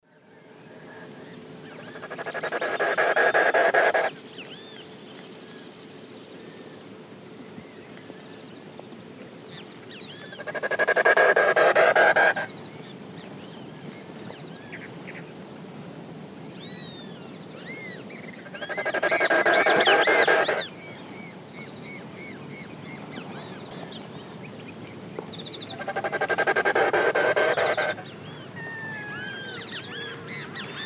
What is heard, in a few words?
Gallinago paraguaiae, winnow; S. America; Eastern Lowlands